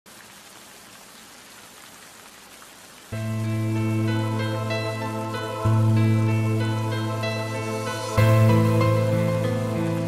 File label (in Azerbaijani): hezin piano musiqi; celal ehmedov